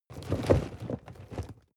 cardboard box medium shake soft short; objekte

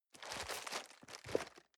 cardboard box medium turn soft; objekte

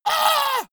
monster hit high; schreie

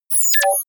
confirm high tech beep, signale; soundpool

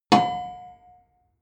metal impact girder

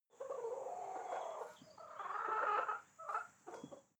hens clucking; natur-tiere; huehner

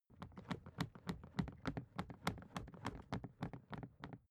briefcase plastic running movement; industrie wirtschaft; bueroarbeit

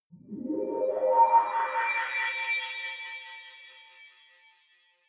energy generator start settle

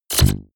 sci-fi plasma cannon fire deep space; schusswaffen

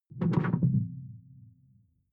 sci-fi alien bloom stop; swooshes-swishes

